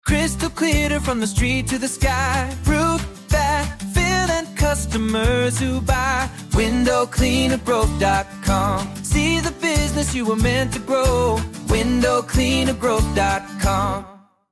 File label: jingle